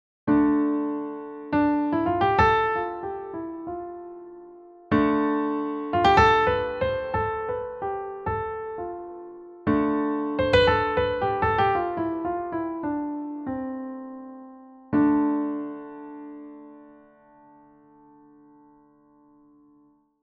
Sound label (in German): dorisch